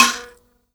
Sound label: mbira; BS MBIRA1; Heart of Africa